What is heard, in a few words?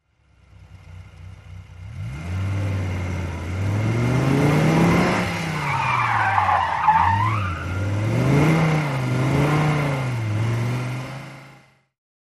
Idling Accelerate, sports car